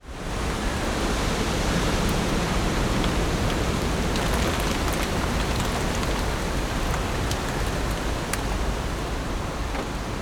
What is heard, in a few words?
nature wind strong trees rain drips on cars forest car park